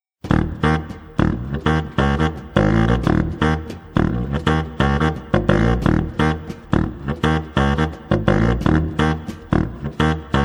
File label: tuba